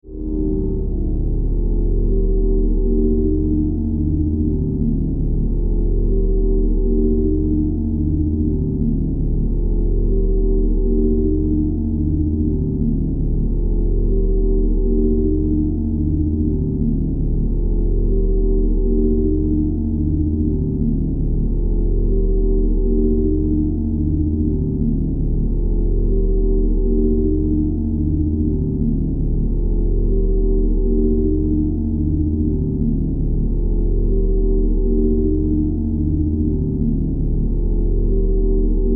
Engine Room Power, Engine, Room, Power, Drone, Science Fiction